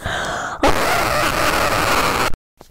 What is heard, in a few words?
screaming; Memes